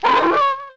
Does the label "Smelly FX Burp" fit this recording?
No